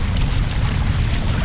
bubbles; Original TK Sounds

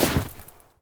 snow land; Golden Crayon Sounds

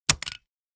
stapler; Golden Crayon Sounds

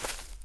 grass step; Golden Crayon Sounds

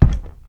vent step; Golden Crayon Sounds

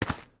dirt step; Golden Crayon Sounds

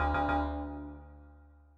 error; NVDA; Cubic

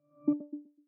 Lock; iOS 27; PearlEnrollment

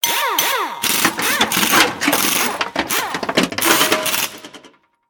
vehicle repair; Original TK Sounds

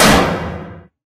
wall metal; Golden Crayon Sounds

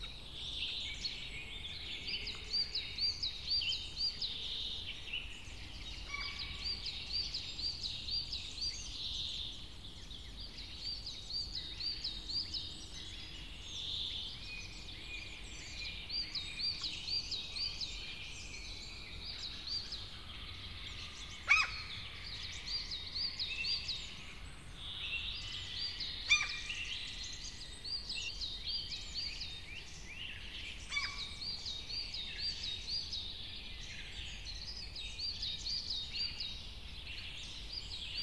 birds; Golden Crayon Sounds

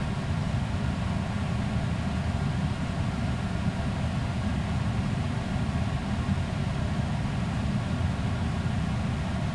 vent; Golden Crayon Sounds

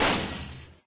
wall gate; Golden Crayon Sounds

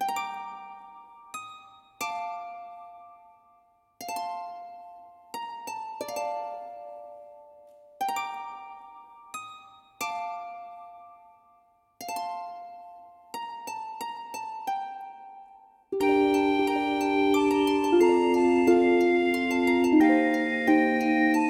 church, music; Manamon Music; Manamon 1

